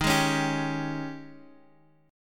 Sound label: G sharp Major 7th Suspended 4th, D sharp